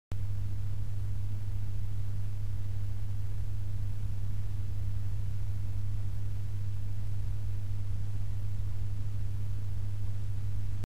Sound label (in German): Xigmatek Prime mit Kuehlkoerper 5V